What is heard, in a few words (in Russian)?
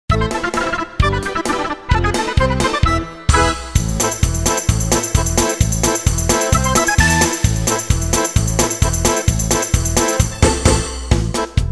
Igrai gitara